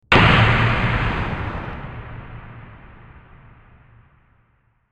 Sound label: luminous hit big; Ninja Tracks